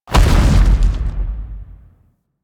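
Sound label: Shockwave Ground Impact; Enemies; Abilities; Warframe; Corpus; Arachnoid Camper Terra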